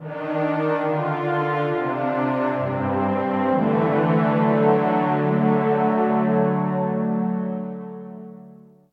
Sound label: Appearance Fanfare; Metroid Other M